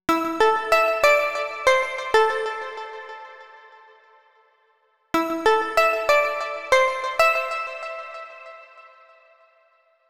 Harp Melody

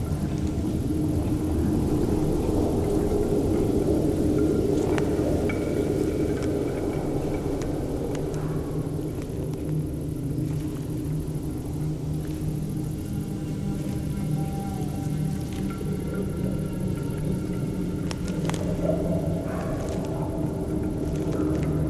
Ruins Ambience

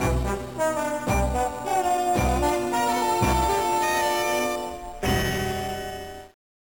Mission Failed; 007 Nightfire; GBA

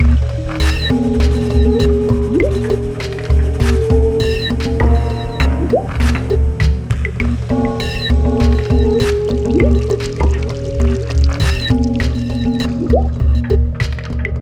Water Action

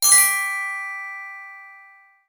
Success Chime Grand; The Princess and the Frog; wii windows

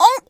Pink Collision

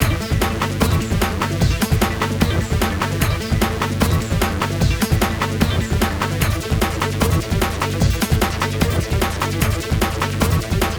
Bounce Trance; dokusenjima anta o suki ni naru kurai nara haramasareta hou ga mashi yo original soundtrack

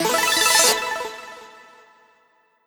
Battle Intro; CrossCode; Switch PS4 Xbox One PC macOS Xbox Series X Linux

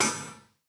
metal hit; teardown; ps5 windows xbox series xs

